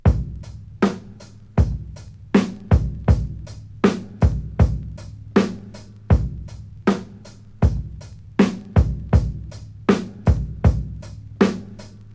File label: 79 bpm drum loop c key